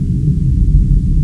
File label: death star amb; star wars trench